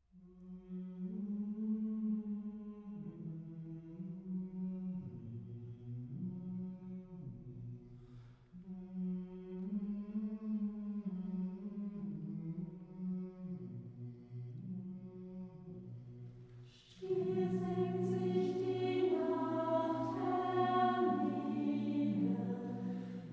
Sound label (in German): Wiegenlied